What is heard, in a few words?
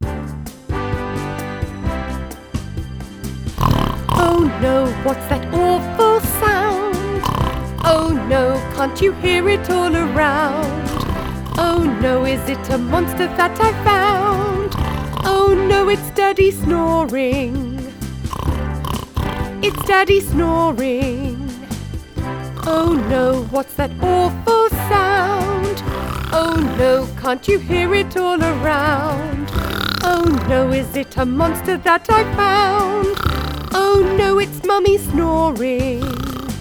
Someone's Snoring